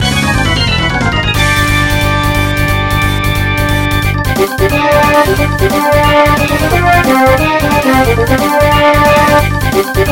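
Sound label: Boss Battle, MIDI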